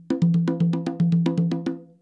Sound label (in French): roulement ternaire, congas